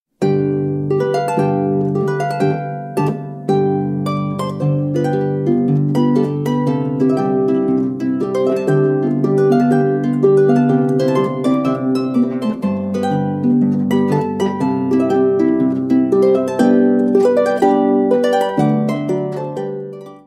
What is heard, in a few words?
Marsch, tiroler harfe; Moanhofer-Marsch